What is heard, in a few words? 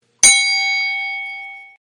bell message tone notification sound